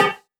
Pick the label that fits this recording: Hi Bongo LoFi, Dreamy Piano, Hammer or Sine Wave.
Hi Bongo LoFi